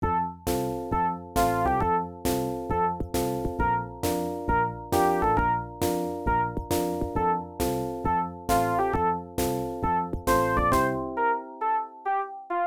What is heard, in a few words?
Folk Dance; Seasons of Sakura; IBM PC AT